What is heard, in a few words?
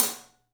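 SABIAN HAT, left; 3D Sound; 3D Drums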